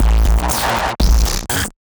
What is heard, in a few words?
Dirty Rumble; Sonic Foundry (Sony Creative Software); Underground UK House & Electro